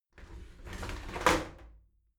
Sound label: shower slide doors open soft